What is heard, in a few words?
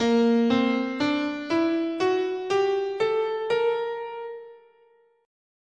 A sharp Lydian Augmented, scale